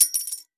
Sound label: Coins Drop, tip Jar; Marketplace